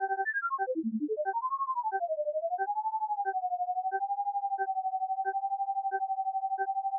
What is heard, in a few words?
Damped Sinusoid